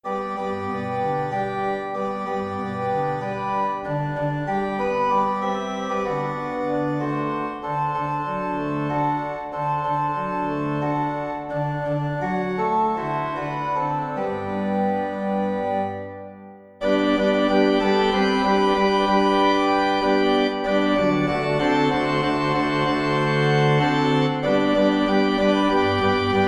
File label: Organ